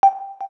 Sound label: KEY XYLOPHONE 9, keytone; Samsung SGH-T369 Linx